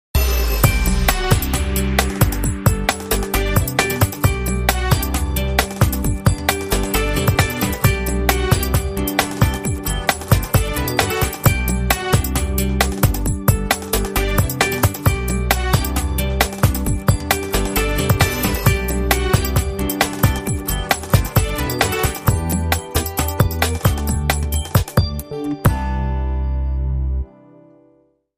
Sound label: Bright Funky Tune